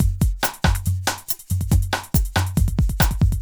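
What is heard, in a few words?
industrial 140 kit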